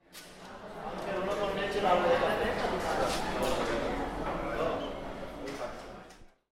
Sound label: cafe, school